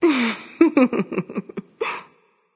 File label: laugh; smite; aphrodite